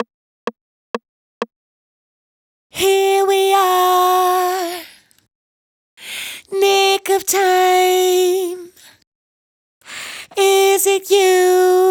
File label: Verse, 127BPM; Vengeance Samples